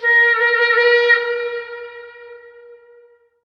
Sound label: Horn; chivalry medieval warfare 2012 pc